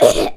fly cough

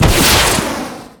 rocket launch short

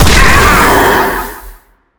demon hit

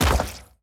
bounce large; fistula

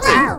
cute grunt

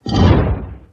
dash; maskinfamy

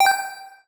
pickup penny

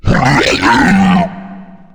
appear; pestilence

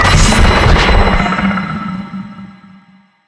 berserk start

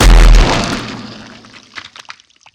land smash; finalmother

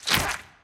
face smile appear; gurdy

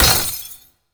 freeze shatter

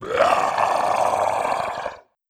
roar; greed

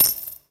penny drop

